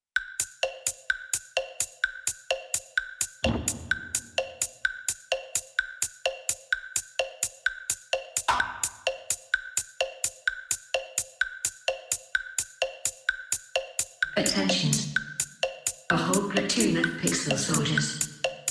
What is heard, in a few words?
techno live sets